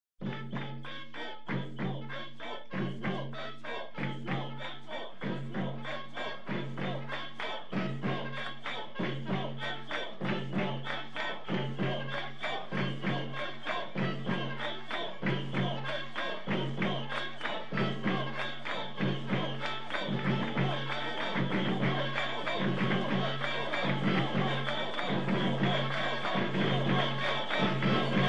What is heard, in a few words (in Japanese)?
matsuri